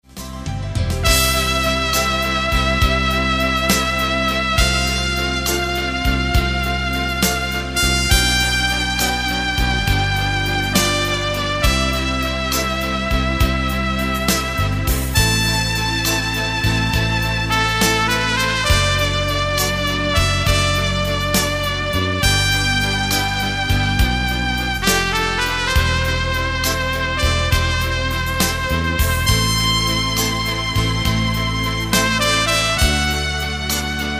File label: piano